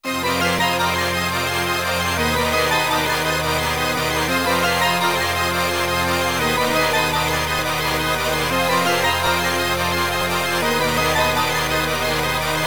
BGM Fort; Dungeons & Dragons Collection; Saturn

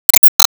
Gatling Shot

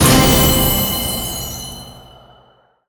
Bonus Intro Stinger; Skylanders Cloud Patrol; Arcade, iOS, Mobile

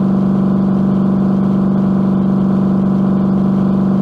Engine Muscle Rpm Low; kart racing; Boom Karts; Android

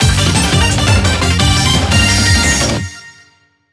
JINGLE battle awaken; beyblade x xone

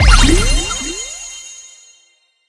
Claim Reward Daily Mission; subway surfers city sound effects; Android, iOS

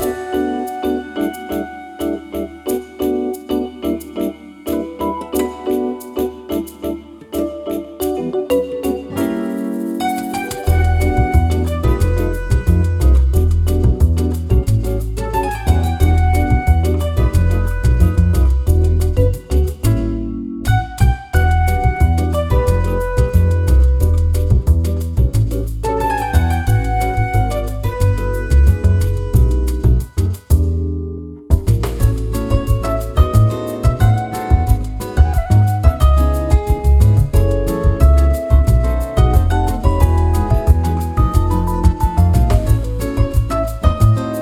Music Shop; Elsie

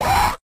Goblin; Goblin Old

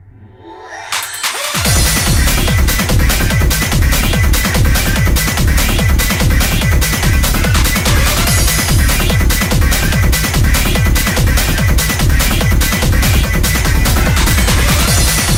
Techno Music Select & Decided; beatmania IIDX 18 Resort Anthem

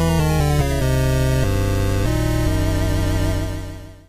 Defeat; Knights of Pen & Paper

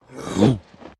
Throw; Baron; Going Under

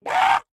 Alert; Joblin

